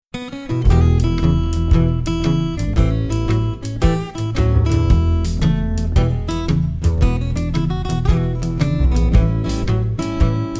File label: 12 bar swing